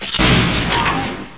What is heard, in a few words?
Lazer